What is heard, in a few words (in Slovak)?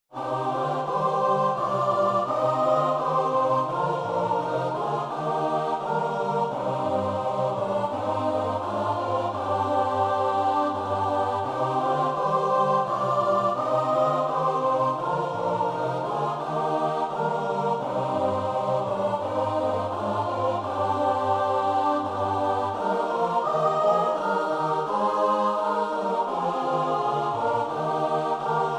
zbor; zborove skladby; vianocne